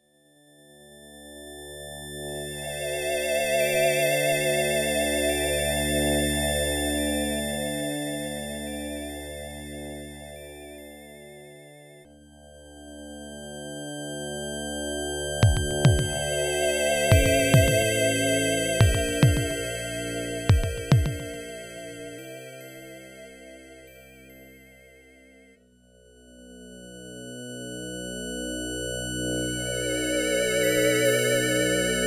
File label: Death; EVE Burst Error; Ryu Umemoto